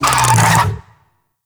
Infested Big Ashen Vocal Pain; Warframe

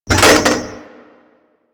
Attack Osprey Flaps Open; Warframe; Corpus

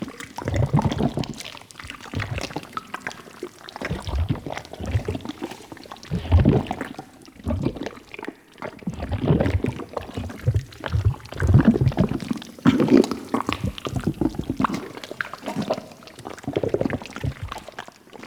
Infested Microplanet Cyst Loop Med; Props; Warframe; Infested Eris

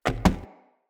Wood Stool Impact Light

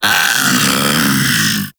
Vocal Attack, Infested; Splitter Split Top; Grey Strain

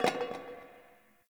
Garbage Metal Can; Warframe; Ambience; Nineteen Ninety Nine

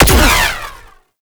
Shocker Fire; Warframe; Corpus; Corpus Railjack; Spec Ops Shocker

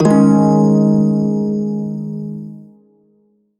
Shawzin Yo Chord; Warframe; Prime Shawzin

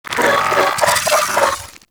Vocal Idle; Warframe; Moa Satyr

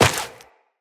Step Dirt, Footsteps Walk; Tenno

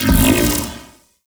Ship Orbital Strike Cannon Start; Gameplay; Ambience; Warframe; Corpus Ship Remaster

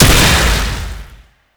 Incarnon Wrist Gun Projectile Explosion, Weapons; Warframe; Tenno

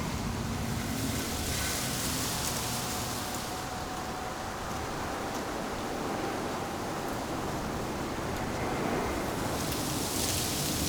Exterior Foreground Forest Scared Sad Loop; Warframe; Duviri

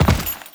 Land Default; Enemies; Footsteps; Warframe; Teshin

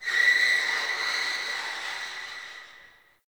Distant Car Squeal; Warframe; Ambience; Nineteen Ninety Nine

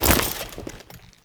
Foley Step; Warframe; Kahl

